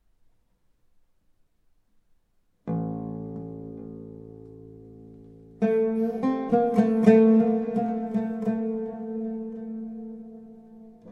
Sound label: Red Sand Wind oud solo version